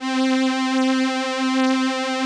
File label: VP-330, String Machines